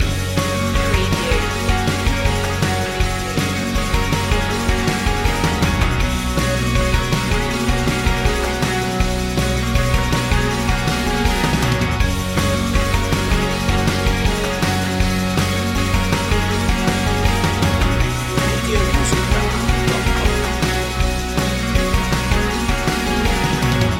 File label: loop